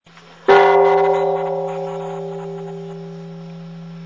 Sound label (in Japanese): kanenone; 着信音やアラーム（目覚まし）音に使える、着モーションとして; FOMA